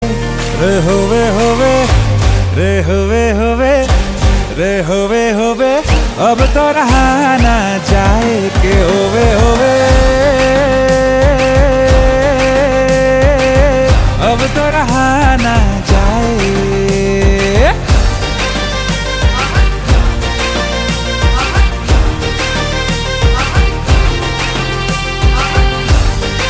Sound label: Ringtone